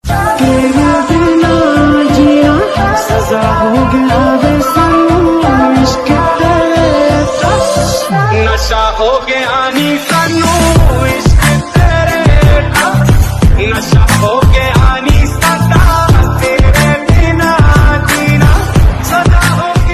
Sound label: hindi love music ringtone, bollywood